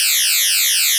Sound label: tweeter